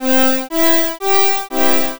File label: strings